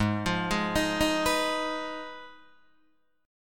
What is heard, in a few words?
Ab Minor 6th, Ab, Guitar, Open D